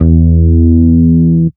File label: Root E, Bass